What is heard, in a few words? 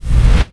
preattack; dota; pugna